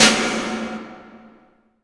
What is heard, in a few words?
IMP SNR DRUM, Drum, Cinematic Impact